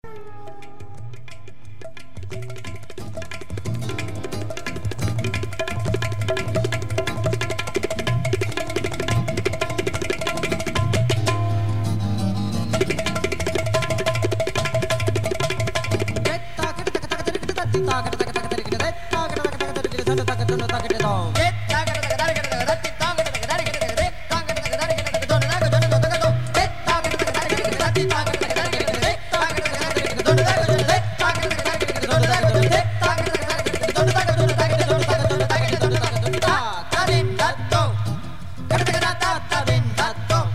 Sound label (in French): inde, voix ethniques